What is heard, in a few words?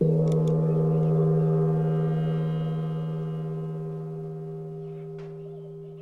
gong; timbre